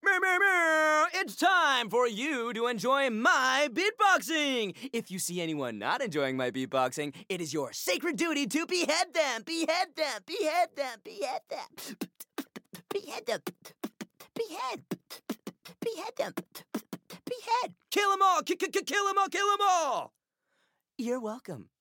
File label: Beatboxing